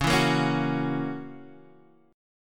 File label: F sharp 7th, guitar